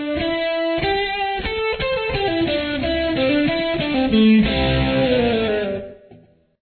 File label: lick, guitar